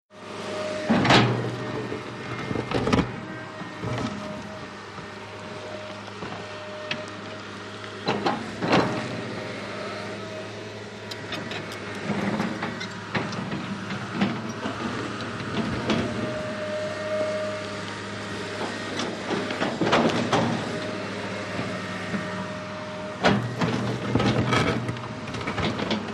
VEHICLE - CONSTRUCTION JOHN DEERE 750: EXT: Digging with back hoe, close to bucket.; 750 Dozer